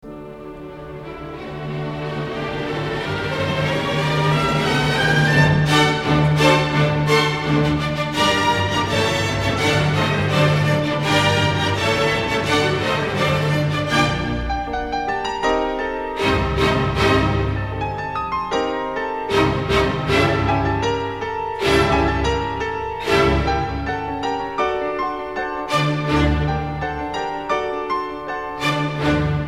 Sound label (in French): concerto piano; mendelssohn